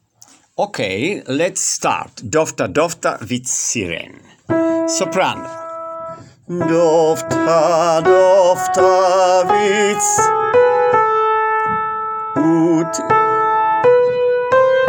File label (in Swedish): sopran